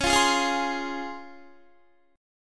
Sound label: C sharp Major 7th, Guitar; C#M7; strummed